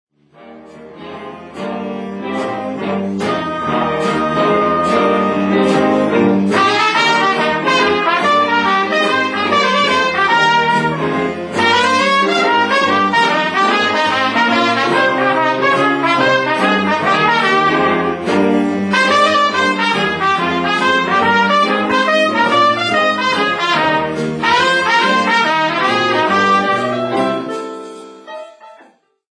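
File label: trumpet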